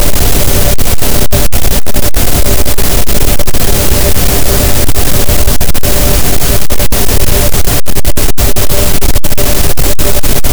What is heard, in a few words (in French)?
cachalot, Physeter Macrocephalus, gaussian noise